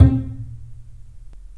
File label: PLUCKS, The Piano; InVision Interactive